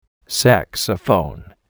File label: saxophone